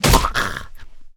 zombie hit; DeadFright

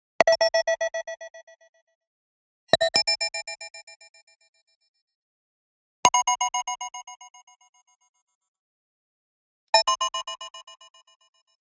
Arcade Click Neutral; boom library; The Interface

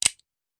Hand Gun Foley; Sound Ideas; Guns

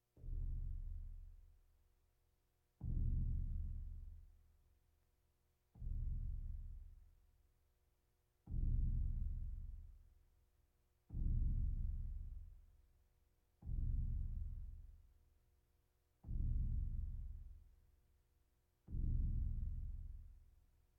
Percussion Bongos Tympani rolls Gongs Drums

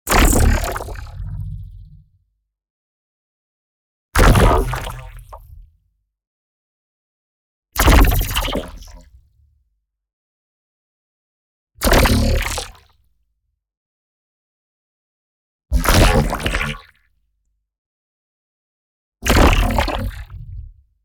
NEON ZAP Warp Core; boom library

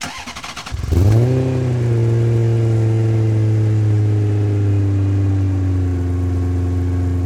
Mazda MX3 Start Loop; Automobiles

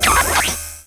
Pickup Elec Energy Activate; Energy & Forcefields

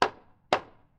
Wall Wood

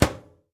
DOOR Microwave Close; mono; Universal Sound FX; DOORS & GATES

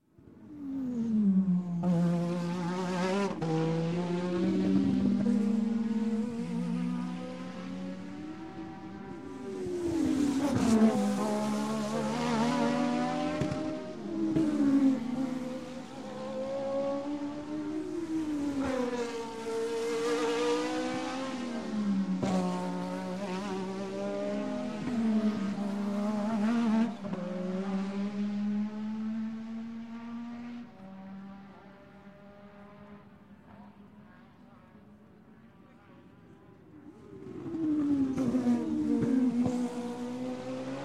Formula 1 Race; Sound Ideas; Series 2000

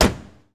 65 Corvette Door Close; Automobiles

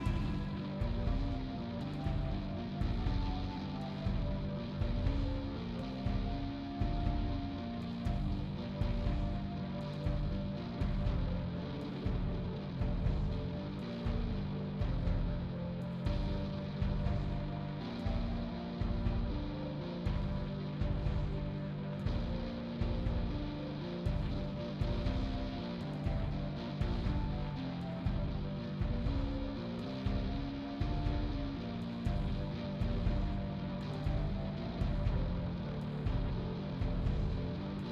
battle Theme; BLIND QUEST - The Enchanted Castle

